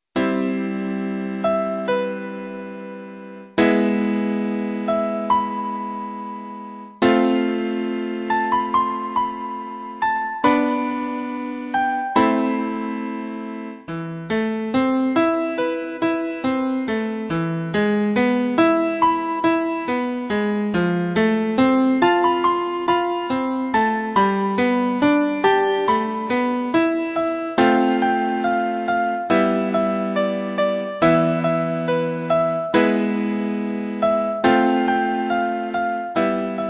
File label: piano; twilight breaking dawn pt2; synthesia piano tutorial